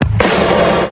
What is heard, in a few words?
big beat, Kick Snare; Drum loops